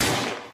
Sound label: grille hit; Weapons